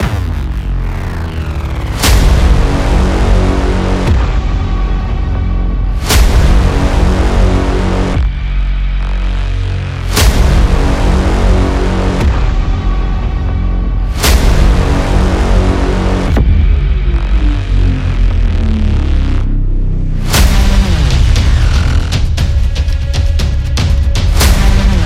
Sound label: Epic Drum; tribe